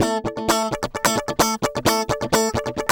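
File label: guitar, 130 BPM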